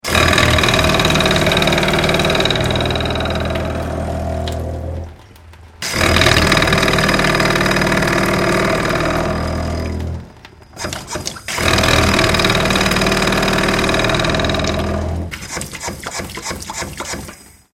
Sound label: Airlock In Pipe, Airlock - Pipes vibrating - air trapped in water pipe x3; Miscellaneous Household; Tom Hutchings